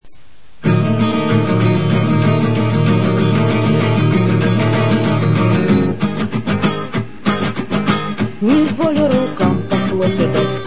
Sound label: Reggae